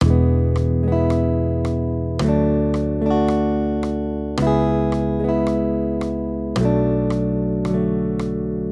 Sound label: maj triads